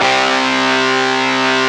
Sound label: GTR FUZZ, Metal; Club-50 - Foundations Roland; Metal Chds; Power Chds